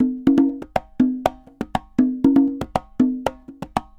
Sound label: congas, merengue; World Grooves; Best Service ProSamples vol.14; AKAI